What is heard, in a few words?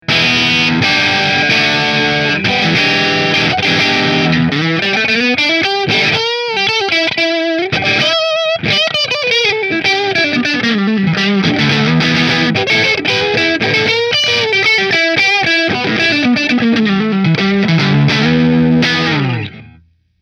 Nola Voodoo Natural Middle Marshal, guitar; New Orleans Guitars Voodoo Custom Natural Finish